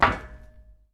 run metal; Escape from Tarkov

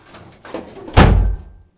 Vehicle Hatches; Red Orchestra Ostfront 41-45

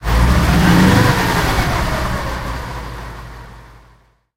m18 engine load, tanks; engines; War Thunder

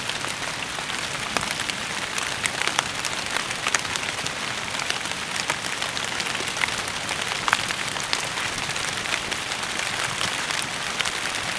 Amb Weather; Red Orchestra Ostfront 41-45

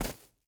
boar footstep grass hard; Rust

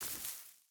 footstep hide grass walk; Rust

